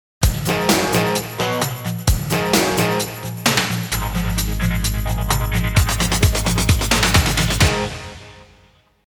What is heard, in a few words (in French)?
Sport Liaison; Jingles medley